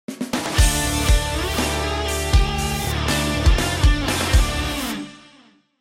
Heavy Rock

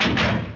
popup; super trench attack

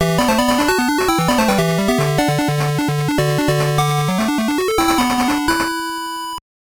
Jingle; Majors Pro Baseball; Game Gear

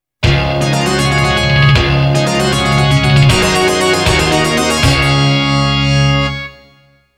Round Clear; Capcom Belt Action Collection

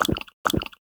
Object Well no effect; Inotia 3

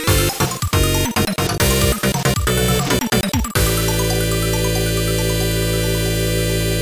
Professional Jingle; superlite 2500 custom mahjong

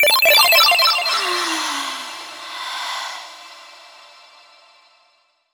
Healing song; YIIK A Post Modern RPG

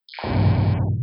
Wpass; tempest 4000

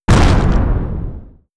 Small Collision

